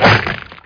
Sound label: CUT BODY